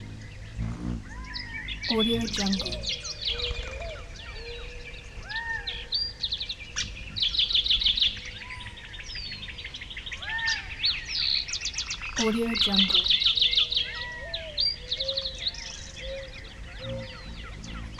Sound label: singing birds in the forest